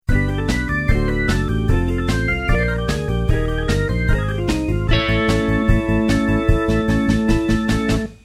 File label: 150bpm; tempo